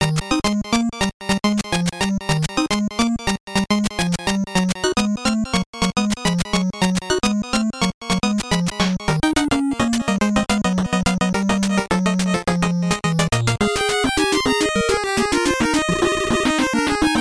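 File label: 8-bit music for mobile phone ringtones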